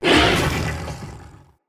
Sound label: Corrupted Battle Dog Attack Charge, Animals, Vocals